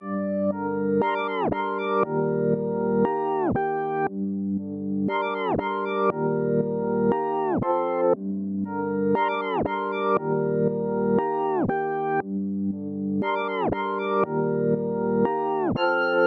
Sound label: lofi keys